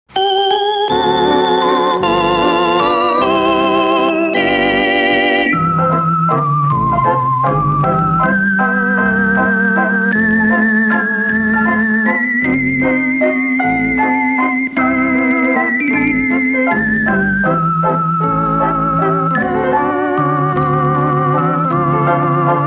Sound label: irish waltz (instrumental)